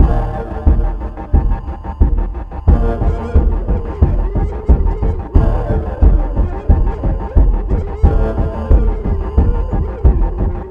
chase music; itsteals; legs; slender

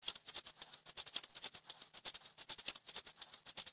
spider footsteps; granny; zerosiki; slender

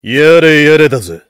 heavy positive vocalization

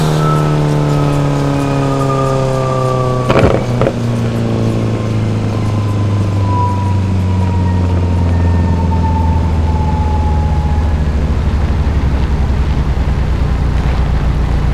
revdown; jopolestar; nfs heat ganimator pack

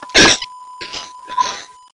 child pain; cof; children

